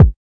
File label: kik, kicks